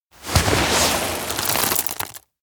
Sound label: bag open coffee beans; anemoi